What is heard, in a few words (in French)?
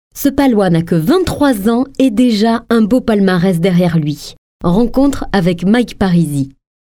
Jingle Rencontre